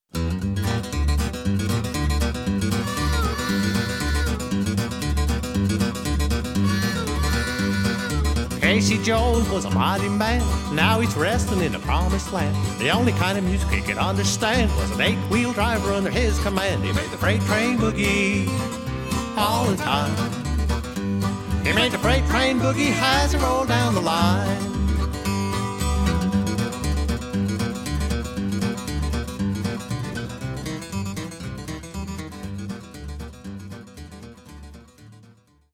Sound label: Boogie